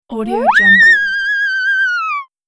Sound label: theremin scifi noise